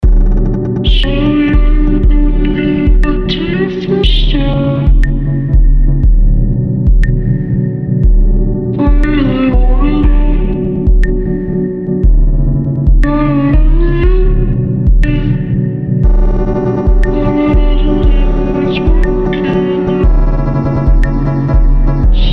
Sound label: crying